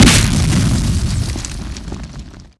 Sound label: explode